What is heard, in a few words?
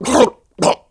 gasp, player male; kingpin